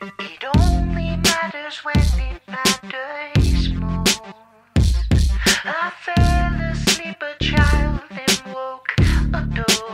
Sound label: Death rattle